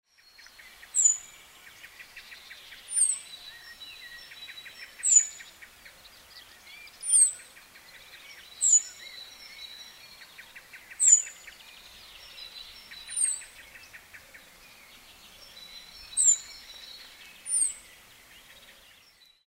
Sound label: cirl bunting